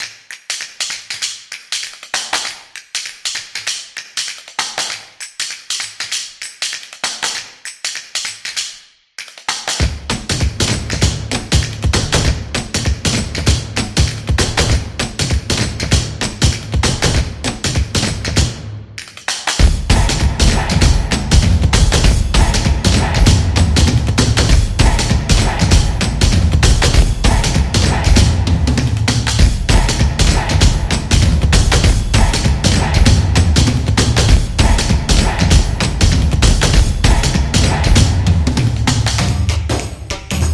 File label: Drumming Music; graffiti